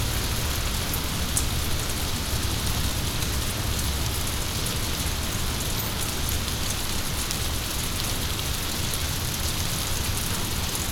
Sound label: Rain, binaural